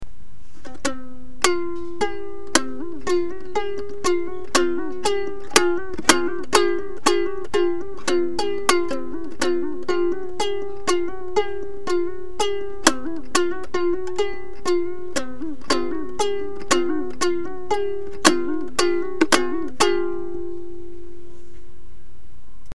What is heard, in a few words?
koto